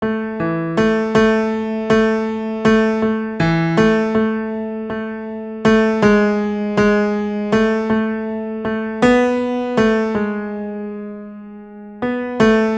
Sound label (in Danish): tenor